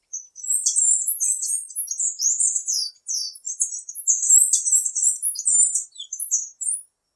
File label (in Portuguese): Saí Andorinha